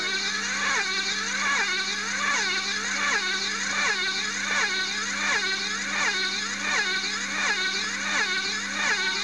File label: model car